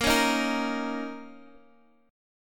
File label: Bb Minor Double Flat 5th Bb, Guitar, Open D